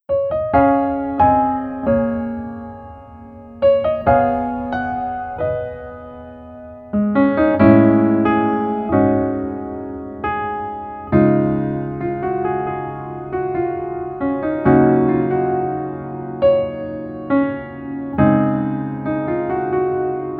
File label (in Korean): Piano ver, 멜로디MR, -1키